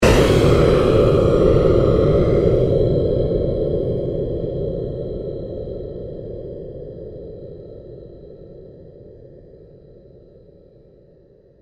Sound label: pulse, Sound effects created by manipulating other sounds; Rise And Dive; Rises and Dives